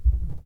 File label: Underneath stairs footsteps; Phasmophobia